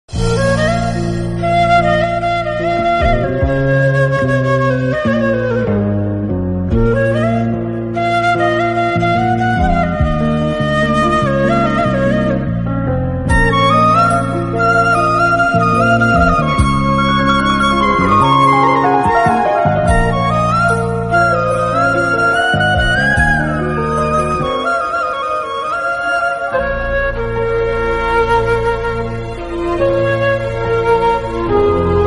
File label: flute bgm ringtone; nithiin; keerthy suresh